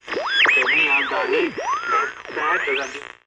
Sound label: Multiple two-way radio communications with interference.; Two-Way Radio Telemetry, Radio Static